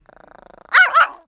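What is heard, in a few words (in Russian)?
лай шпица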